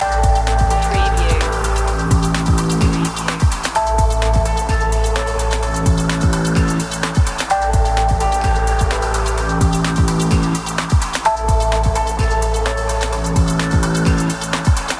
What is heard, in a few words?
chill out dream loop